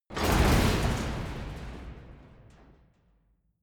metal long; industrie wirtschaft

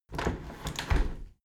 window handle squeak turn close regular; objekte; fenster

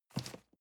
cardboard box medium grab; objekte

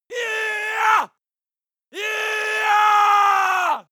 male attack aggressive scream; schreie; menschen

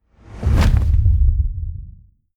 implosion fold up, whooshes; whooshes rises

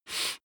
nose sniff male soft; koerpergeraeusche; menschen; soundpool

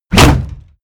hit shield wood

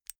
insert xlr extension latch soft; objekte; elektronik

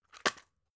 drug package drop; arzt

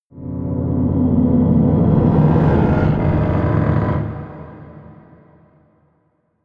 scrapes scary dark, drones

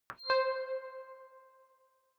click tonal reverb high

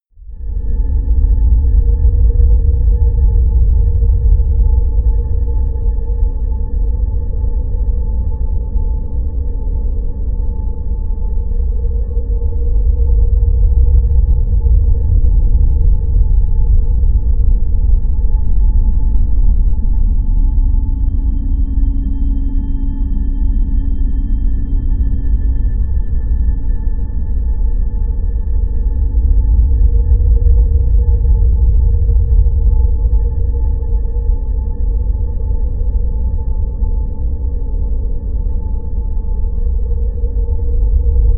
drone ghost

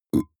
burp very short; koerpergeraeusche; menschen; soundpool

